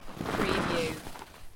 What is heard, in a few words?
Snow and Ice, Snow movement